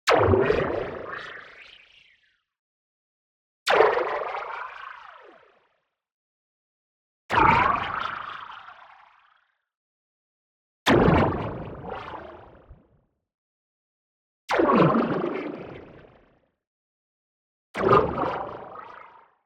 movement fast, doppler impact bend; boom library; Anime Essentials; DSGNMisc

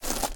gravel jump; firefight

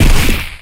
Melee Magical Heavy; Kilta

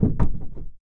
roof body impacts; Blind Drive

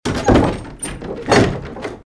Door - Antique Open+Close; RadwinIsland

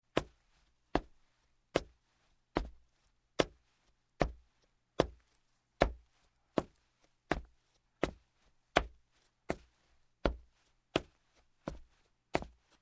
Footsteps, Wood Up Wooden Steps Boots, Slow; Sound Ideas 2000 Series

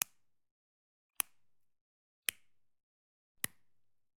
Generic Button Wood Tiny

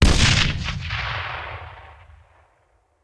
Grenade Hand; Sound Ideas; Guns

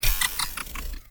ui retry; Space Wave Race

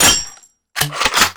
Gun Reload Weapon, Weapons Foley; SFX Kit

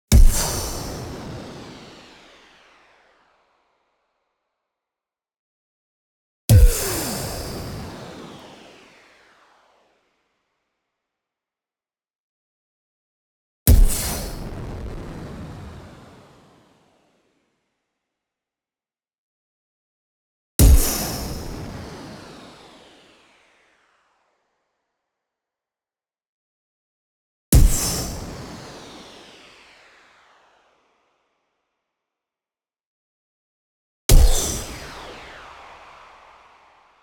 EXPLOSION LONG LONG Shatter Warp Descending; boom library; Anime Essentials

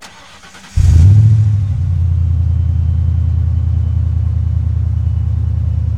Truck Explorer Start Loop; Trucks

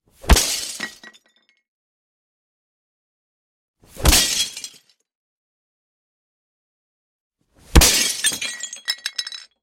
Kicking Glass 3X; Hollywood Edge; Martial Arts and Human Impacts

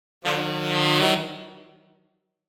Horn Section Criminal Increasing Lower; Hollywood Edge; sound designer's toolkit v2

